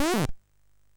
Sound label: Shuffle, sid MOS6581R3